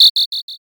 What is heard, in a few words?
whistle short